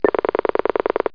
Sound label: pulse